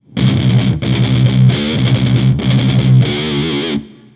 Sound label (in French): tronconeuse; native instruments; guitar rig